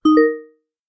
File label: notification